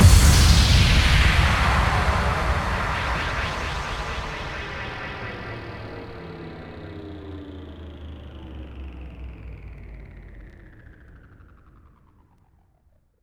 Bassdrums FX, FX Kicks; Vengeance Samples

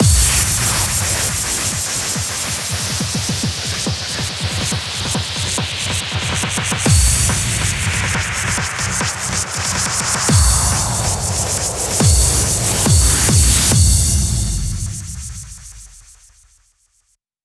140BPM FX 8 Bars; Faith; Vengeance Samples; Snares FX Pairs

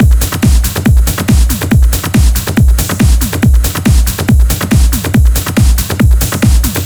140BPM Genetic Kit

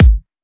Bassdrum; Vengeance Samples

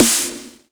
snares; Vengeance Samples

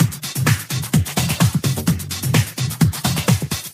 House Loop, 128 BPM; Vengeance Samples

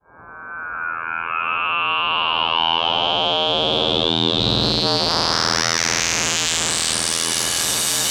Sweeps Long

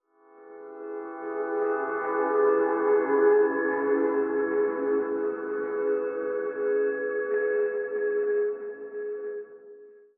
Pad; Dubstep Sample Pack

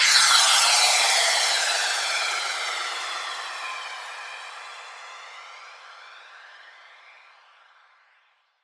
FX Downlifter

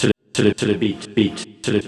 House Loops, Vocal Loops House 128BPM

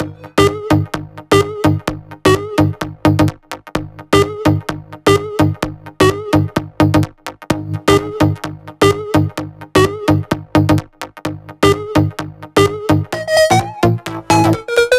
128BPM Melody; Vengeance Samples; Funkalicious Kit

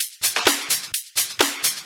House loops 128BPM; Vengeance Samples

